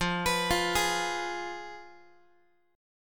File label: F Diminished Guitar; Fdim arpeggiated; JGuitar